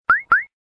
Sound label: Piut Piut Cute